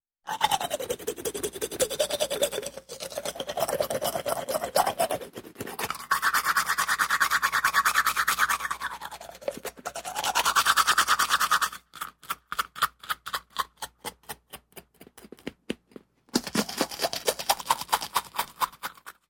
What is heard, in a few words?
Toothbrushing up-close